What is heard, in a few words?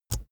keyboard laptop enter push release; kommunikation; computer